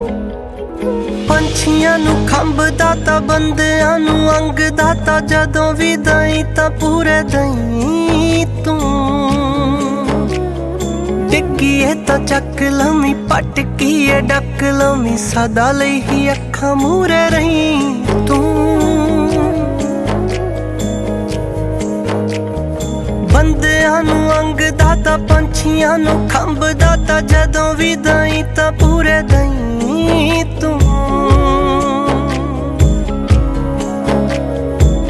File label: Ardaas Karaan male version